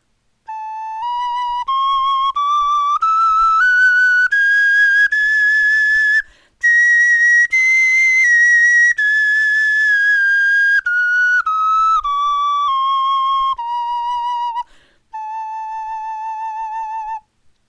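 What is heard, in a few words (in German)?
tonleiter, Knochenflöten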